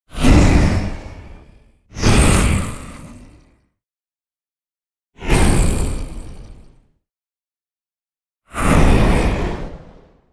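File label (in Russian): темная магия кричит и кричит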